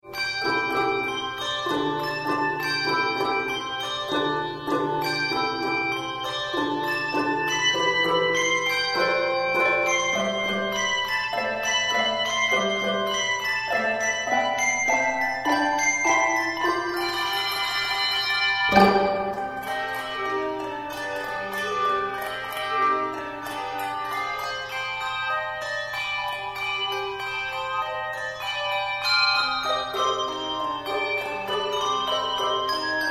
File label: bells of joy